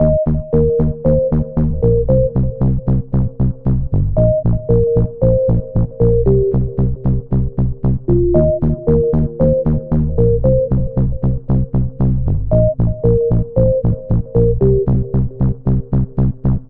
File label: Music Loop Electronic 115 Bpm